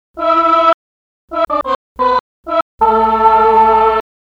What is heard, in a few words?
Sing; Hylics